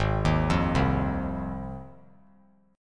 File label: G sharp 6th G sharp down, chord; arpeggiated; JGuitar